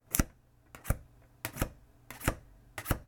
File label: Swiping Atm Card